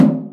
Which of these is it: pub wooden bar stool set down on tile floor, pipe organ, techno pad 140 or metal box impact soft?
metal box impact soft